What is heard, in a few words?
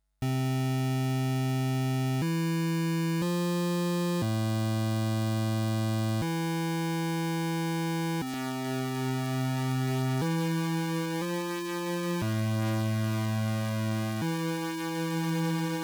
AY3 Osc detune